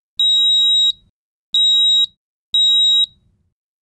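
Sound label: Fire Alarm Three High; hi-tech-various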